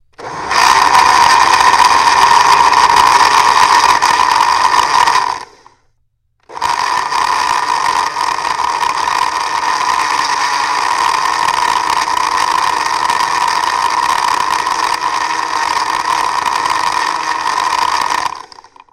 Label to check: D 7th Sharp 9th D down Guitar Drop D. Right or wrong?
Wrong